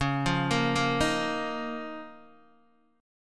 C sharp 6th Add 9th C sharp down Guitar DADGAD

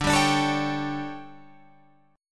D Major 7th Suspended 4th, Guitar, Open F; DM7sus4 strummed

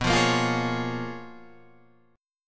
Bb Minor 7th B; Bbm7/B strummed

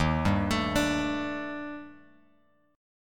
D sharp Major Sharp 11th, Bass Standard; D#M#11 arpeggiated; JGuitar

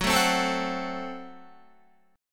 Gb Diminished Guitar; strummed; JGuitar

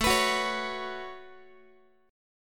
A 6th Add 9th, Guitar; A6add9 strummed; x,17,14,16,17,x

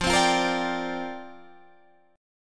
C 7th Suspended 2nd Suspended 4th F

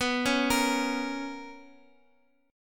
B Major 7th Suspended 2nd Guitar Open F, chord; BM7sus2; arpeggiated; JGuitar

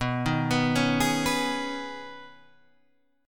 B 6th Add 9th B Guitar Standard, chord; 7,6,9,6,9,7; arpeggiated; capo=6

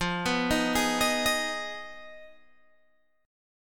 F Minor 7th Flat 5th F down Guitar Open G

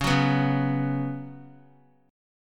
Db 7th Suspended 4th Db down; JGuitar

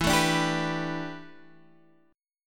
A 9th Suspended 4th, E

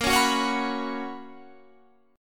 B Major 7th Suspended 2nd A sharp, chord shape

